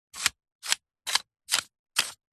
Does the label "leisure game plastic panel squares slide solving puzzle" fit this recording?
Yes